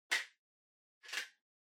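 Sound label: Portable MiniDisc Far Open Eject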